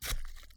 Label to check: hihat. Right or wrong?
Wrong